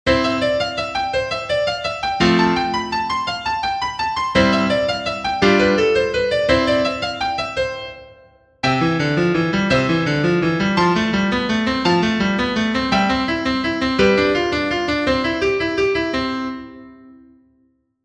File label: Czerny Etude; 50 etudes edited by Germer